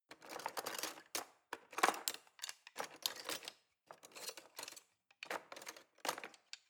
knives wood drawer handle rattle long

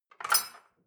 dishwasher cutlery place

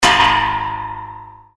bed spring

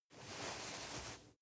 nylon leather very soft

